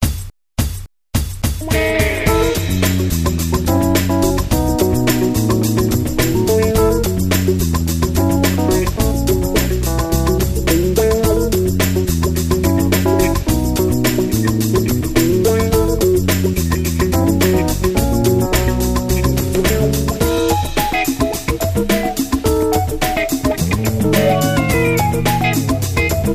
Funky

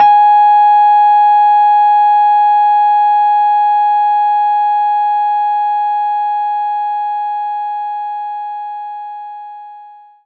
Rhodes Ab4; knights try

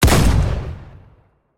Ithaca Fire; criminality

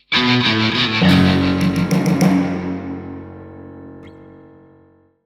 Mini Loose

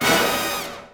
Combo Stab; Bee Movie Game

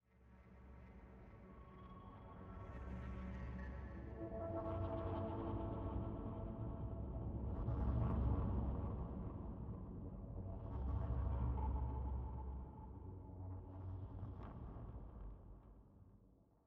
Pad3 85bpm; Legends of Pegasus

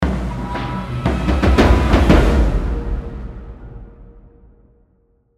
Intense Horn and Perc End

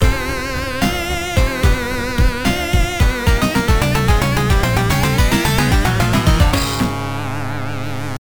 Game Over, Time Over; Sonic Hex Adventure; Genesis

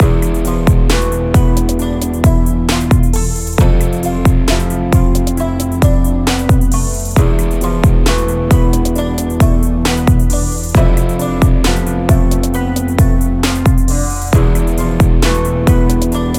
Hiphop Background Only Guitar; Nyakuza; Empress

